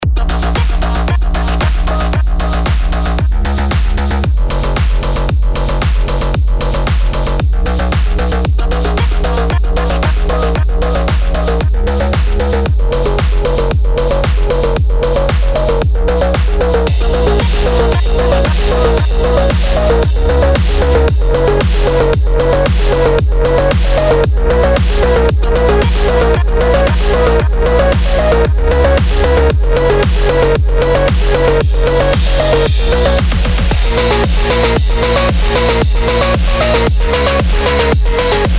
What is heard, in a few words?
Phonk